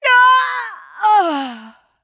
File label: Harpy Death